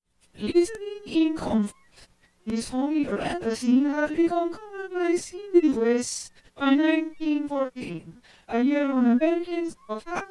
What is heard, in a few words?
rt lpc pitch up